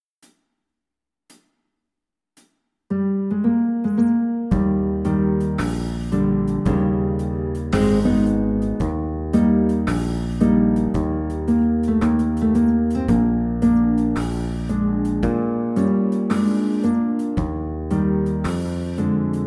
Saxophone

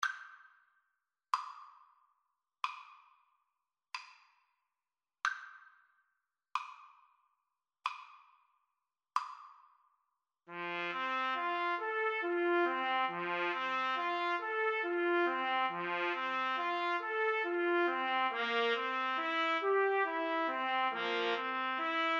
3TPT, Trumpet Trio

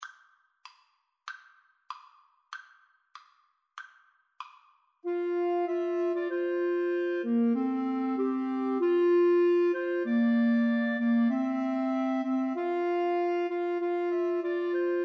Clarinet Trio

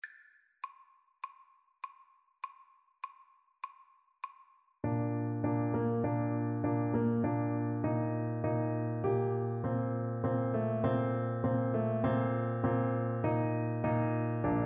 Tuba

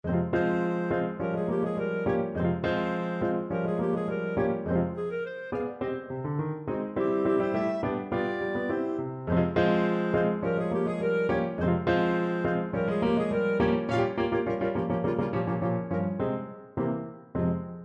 bohemienne, clarinet

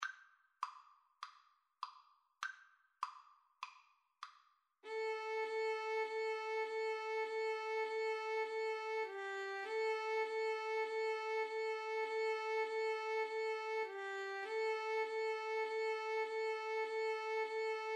birds flyin high, Violin Viola Duet

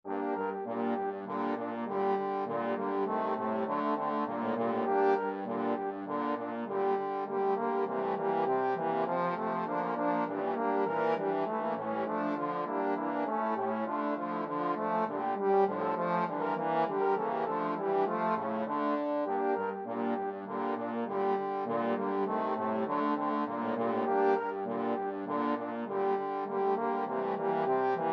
trombone quartet

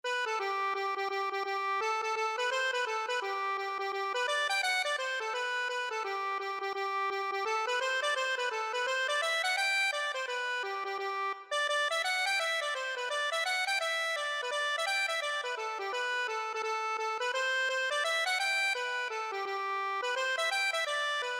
accordion; sarsfield

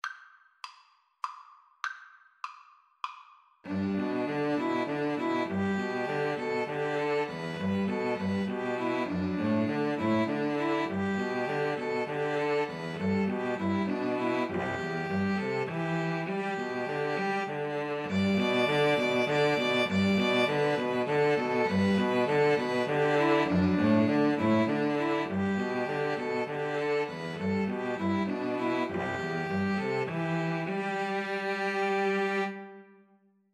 String trio

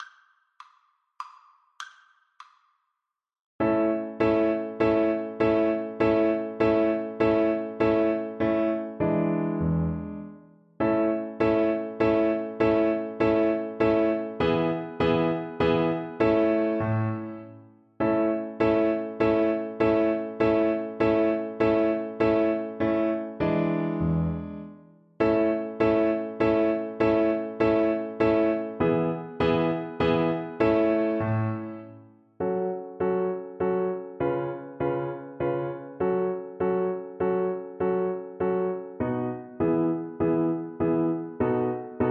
German dance violin

